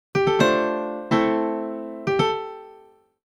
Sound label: DEEPHOUSE PIANO 125 A